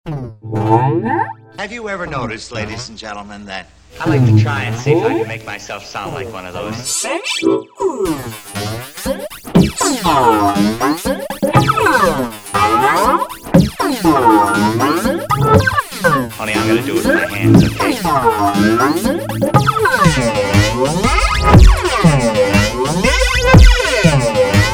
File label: Ultra Violins, Voice Mix